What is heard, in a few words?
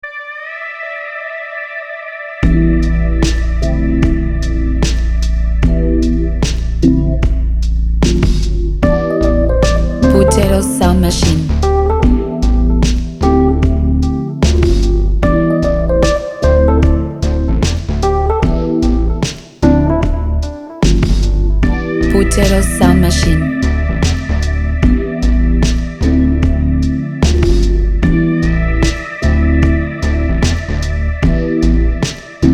jazzy trap